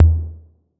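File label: 808 low tom; Wizoo - Platinum 24 Electronic; CHR TOMS 808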